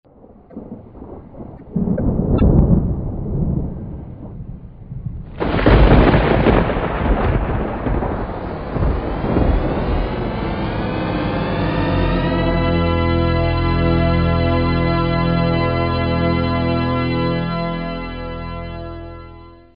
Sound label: thunder ringtone